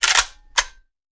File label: Shotgun Foley; Sound Ideas; Guns